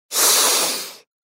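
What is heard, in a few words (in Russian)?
svuk shypeniya koshki, cat hissing sound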